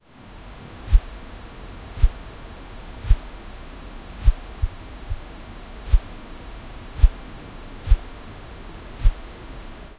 soft asmr soun, textures

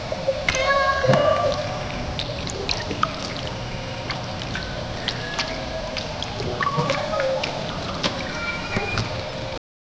indoor public pool, diving

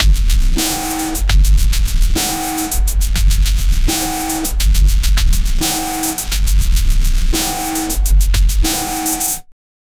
riddim dubstep

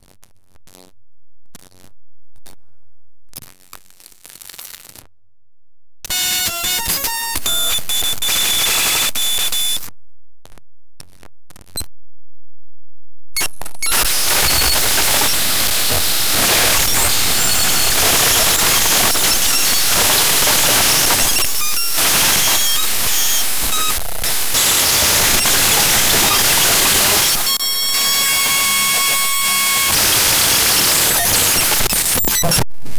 broken wi-fi glitch

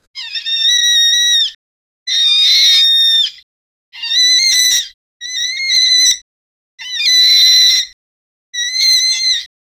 loud scared rat squeaks, rodent

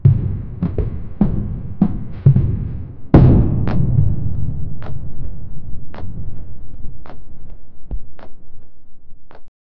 giant pterosaur footsteps

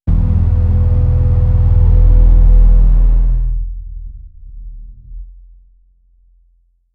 a film transition, cinematic impact

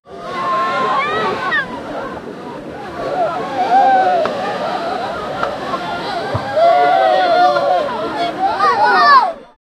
cheerful beach crowd ambi, distant shouts

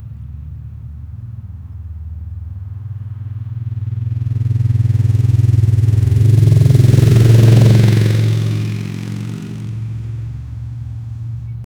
a desert motorbike riding; motorbikes

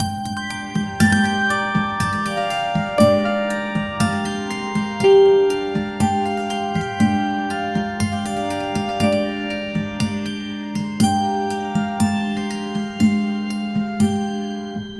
a brief hint of ethiopian

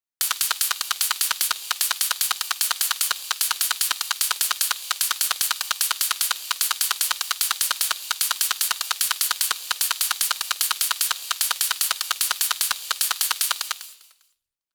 forest psytrance percussi, 150 bpm

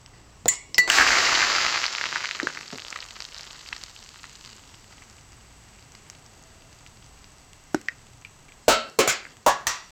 cinematic sound of a, bubbling

